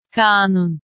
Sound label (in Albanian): kanun